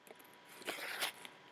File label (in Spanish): plastico rasgar